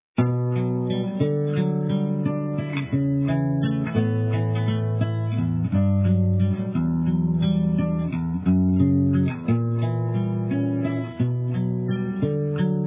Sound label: techno